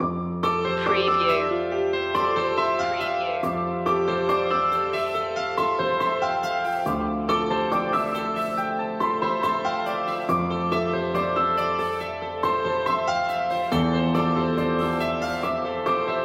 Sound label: drops in time loop